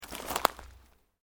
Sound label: Branches Dead Break; Material components for constructing more complex sounds; Breaking (Sound Effects:Objects)